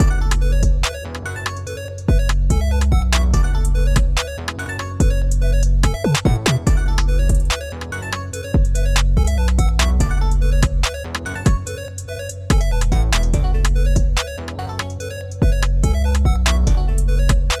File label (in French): Drill hyperpop chelou 144 BPM